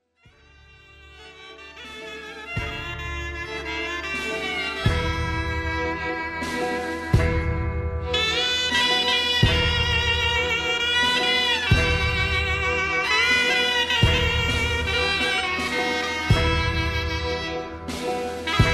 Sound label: Klezmer